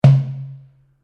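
Drum Darabuka Palm Hit mezzo forte, Egyptian Darabuka Drum; Commanly available Egyptian Darabuka Drum; Musical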